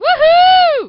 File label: woohoo; griffey